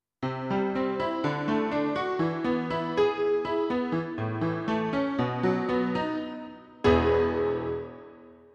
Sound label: Honkytonk; XG